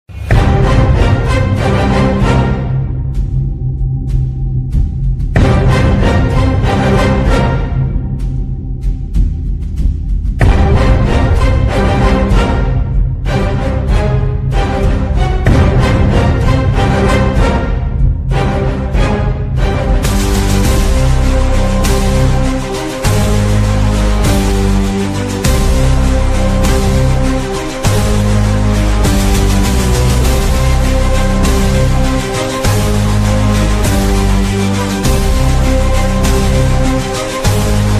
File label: bgm ringtone, tamil bgm ringtone